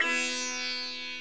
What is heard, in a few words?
sitar